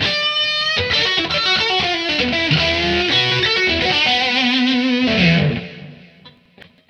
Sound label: lead 122